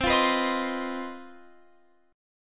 C Minor Major 7th Double Flat 5th chord, Guitar Open F; CmM7bb5; strummed